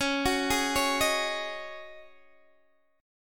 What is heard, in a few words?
C sharp Suspended 2nd Suspended 4th, C sharp, Guitar, DADGAD